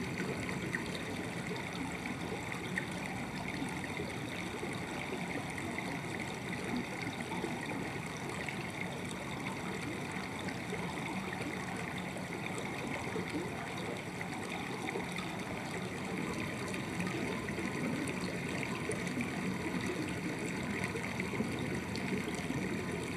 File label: Summit Canyon River Quad; Journey